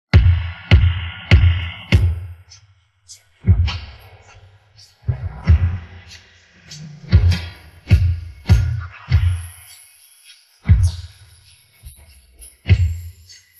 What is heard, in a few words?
Theme Song Drums; Aunt Wang Syrup